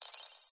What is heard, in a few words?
ninja hit positive sound fx arcade casino kids mobile app